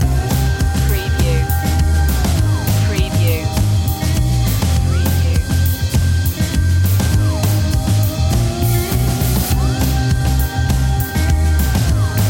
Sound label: showroom loop